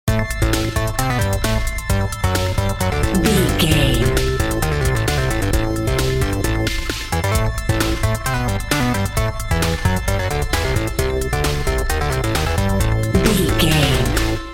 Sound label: intense video game level loop